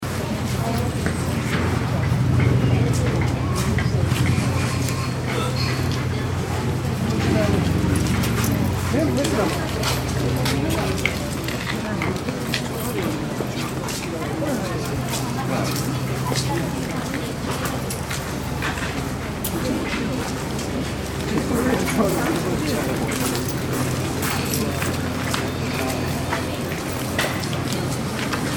Amb Public Street Chatter Tokyo Japan, General public street ambienc; Light people chatter in Japanese, distant; Crowd Sound Effects; LynneMusic